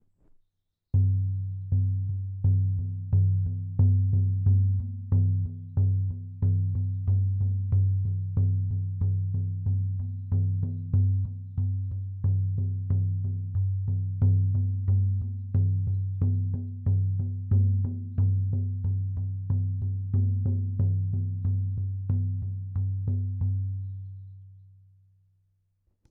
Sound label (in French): tambour chamanique en peau de chevre